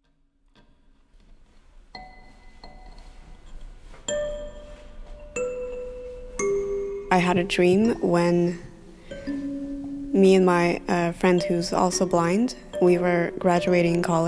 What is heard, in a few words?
Dream Motif Workshop Soundscape